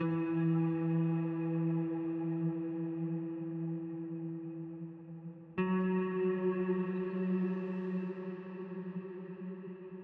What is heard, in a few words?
172 BPM B Min Guitar; RnB; Melody; Loop Stems; Cymatics; 2022 Melody Collection; Houston Dancing